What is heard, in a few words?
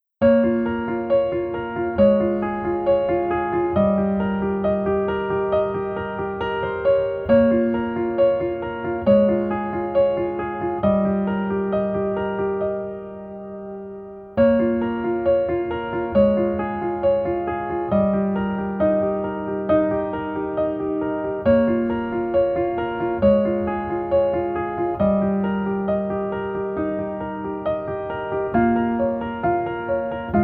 Piano ver